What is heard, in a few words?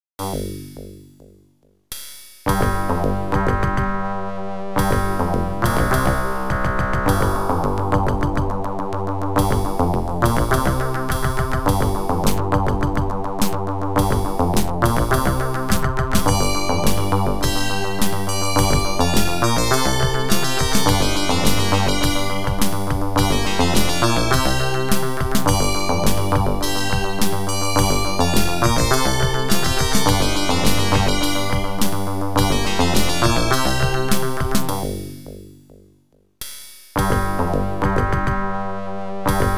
OPL2; The Navigator; Tyrian